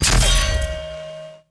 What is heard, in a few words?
atk goldspear